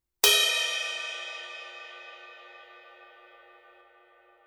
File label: stage ride, cymbal; sabian